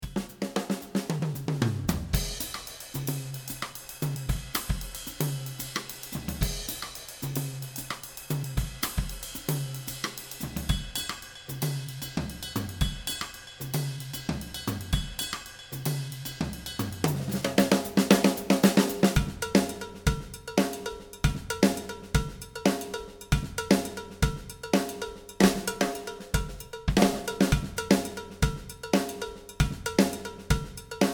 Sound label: Solo Mozambique, drums